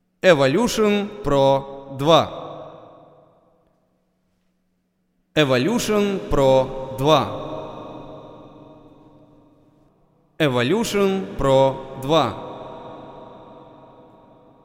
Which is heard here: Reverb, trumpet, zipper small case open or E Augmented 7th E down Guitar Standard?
Reverb